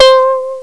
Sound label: koto; Echo 1.1